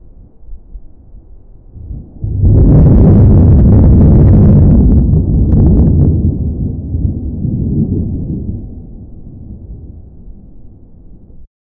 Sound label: a deep rumbling thunderstorm rolling across the sky